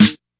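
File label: acoustic snare, Classic Hip Hop; STYLE KITS; Classic Hip Hop Mega Kit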